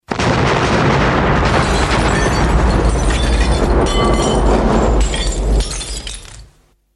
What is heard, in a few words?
Huge explode